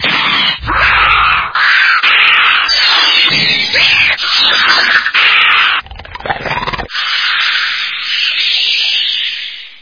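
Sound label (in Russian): монстр резкий высокий вопль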